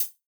Hat

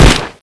MAC10

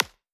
future bass kick high pass

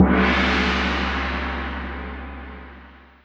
japan perc

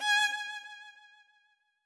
violin

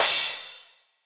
crash

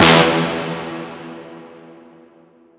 bass

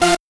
synth; osu

